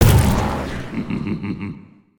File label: smashable; Blue Demon; destructibles